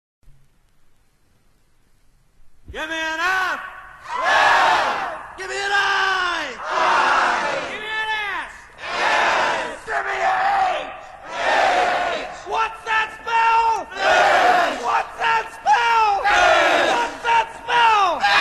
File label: FISH Cheer